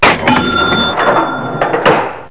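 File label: cash register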